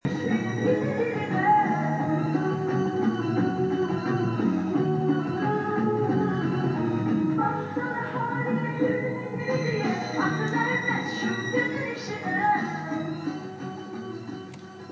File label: 70 s Turkish disco